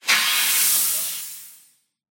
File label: ambient steam rush; ambient air; Half Life Alyx